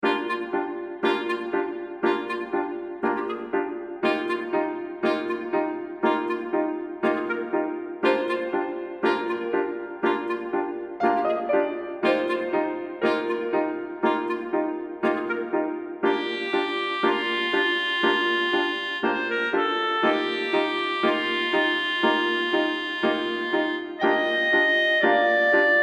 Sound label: WALKING For Clarinet Piano Beginner